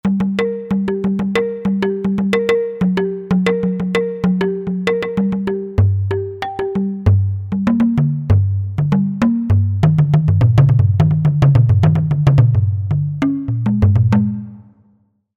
Log Drum

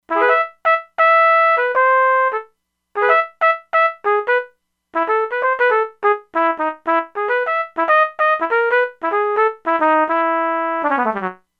Trumpet; E-mu Proteus-2

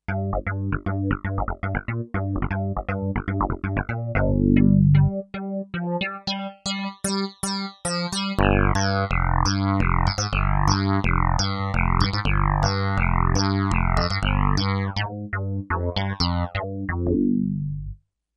Rave Rizm, Kawai K5000S factory presets

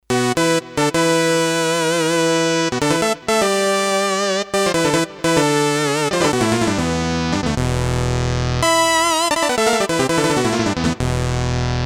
5th Lead, M-Audio Venom

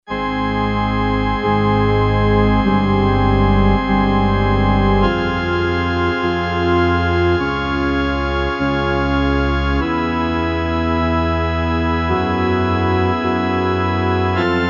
CHURCH ORGAN; Akai SG01v

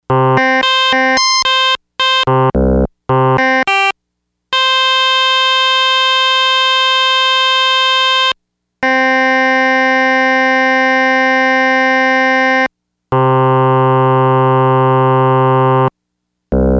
FM PULSE, Yamaha DX100; Factory Patches

